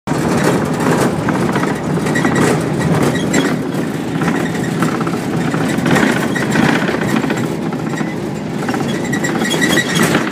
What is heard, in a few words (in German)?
Sammeltaxi